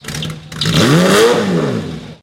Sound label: alfa stradale pulse tone